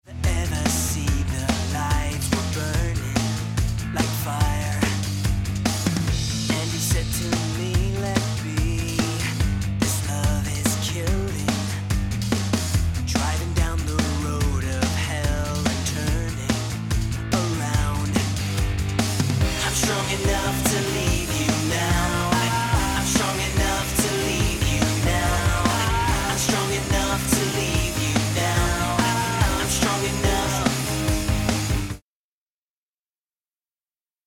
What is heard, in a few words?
pop rock